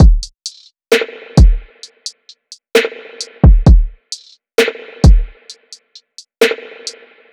Drum Loop 131 BPM; Temptation; Cymatics; Vibes II Drum Loop Collection

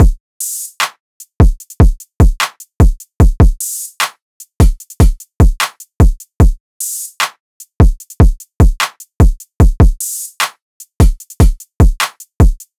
Full Drum Loop 150 BPM; Drums; Humble Hip Hop Sample Pack

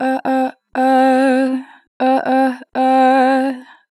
Ah - 2 bar vib, Natural Vibrato, Vocals; Harmor Vocals

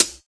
Closed Hat; Drums; HiHats; Ghosthack Advent Calendar 2017 Day 5

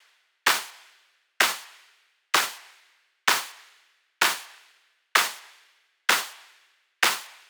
Drum Loop Clap 128, Melodic Techno; Glacier; Ghosthack; AC2024 - Day 12 - Melodic Techno

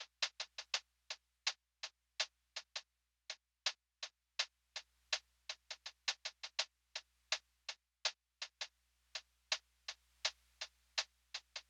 Hihat Loop, 82 BPM, Lofi; Hihat Loops; Helper; Cymatics; GHOST (Beta Pack)

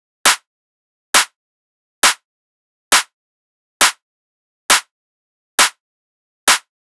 Clap Loop 135, Techno; Ghosthack; Techno Sounds 2025

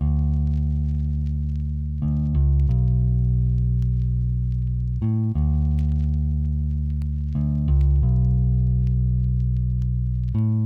Classic Melody Loop - 90 BPM D Maj Bass; Loop Stems; Cymatics; 2020 Melody Collection

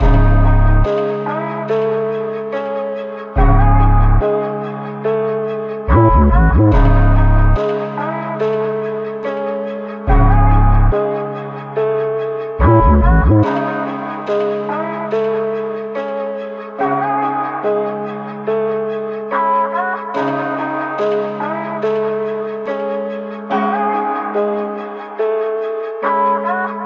143 BPM C# Min, Hip Hop Melodies, Drill; Balaclava

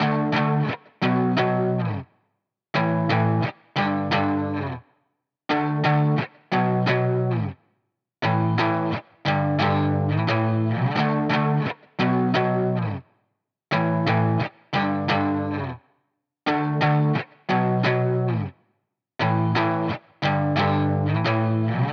175 BPM D Maj Powerchords, Guitar; Cymatics; Miami Guitar Pack - Pt 1

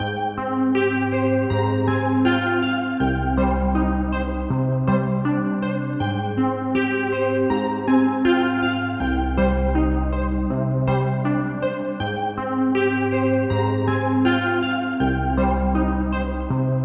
Moog One Keys, 160 BPM C Min; Stems; Cymatics; Aquamarine

